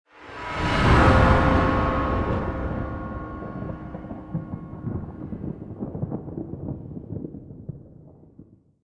player dies; RadwinIsland

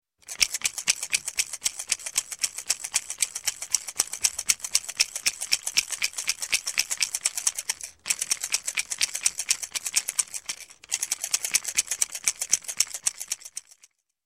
Toy Rattle Toy, Rattling; Sound Ideas 2000 Series

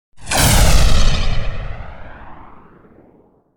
Explosion Sci-Fi Explosion - Pulsate; Hollywood Edge; sound designer's toolkit v2

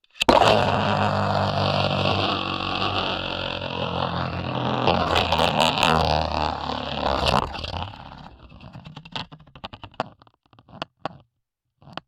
bayblade; devices accessories utilities; NS Studios - ultra sound collection

